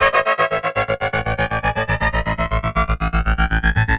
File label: Progressive Synth, Trance